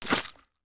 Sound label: jump slippery; fallguys